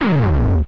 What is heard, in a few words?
fail shard; hero-mark-2